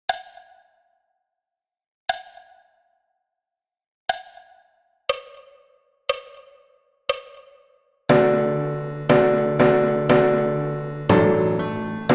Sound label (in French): piano et timbales a 60 bpm